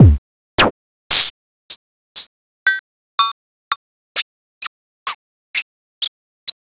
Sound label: polivox